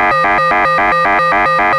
Moog G3, Synthesizer; Hollywood Edge; Giorgio Moroder Rare Synthesizer Collection; MLTI.MOOG

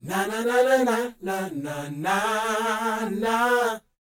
NA-NA A; Spectrasonics Vocal Planet

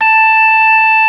ORG. 3 A6; B3 FULL

